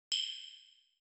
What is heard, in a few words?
hit; blocks up